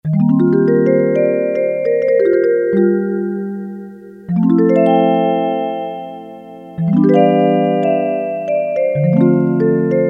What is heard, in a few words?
Vibraphone, Casio CT-370; Tones